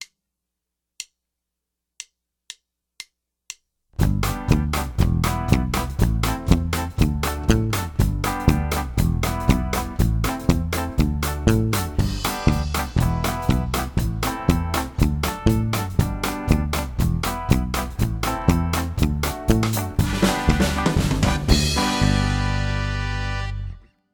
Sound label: Ska Bass; Beginner Ska Bass Lines Writing Formula